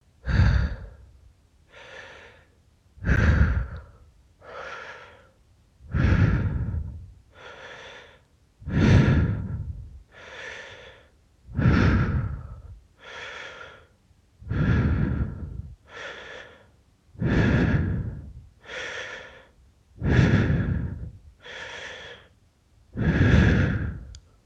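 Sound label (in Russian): дыхание шумовой стерео-эффект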